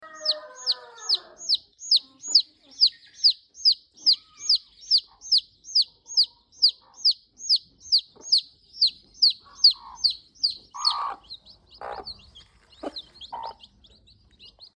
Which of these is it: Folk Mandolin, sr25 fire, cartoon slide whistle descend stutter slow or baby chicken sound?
baby chicken sound